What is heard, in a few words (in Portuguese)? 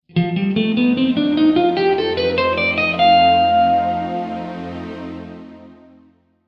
Escala de F Mixolidio 4